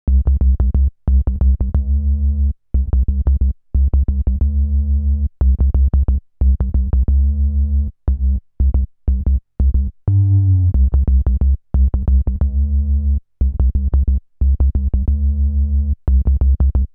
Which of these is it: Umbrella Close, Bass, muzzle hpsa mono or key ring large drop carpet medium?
Bass